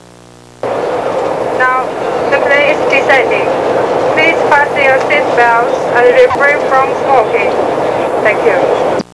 passage, Transportation

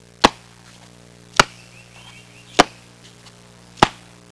BALL HIT; Sports

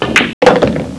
pool, Sports

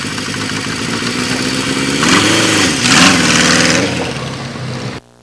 REVVING, Transportation

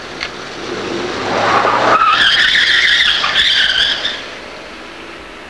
TIRES, Transportation

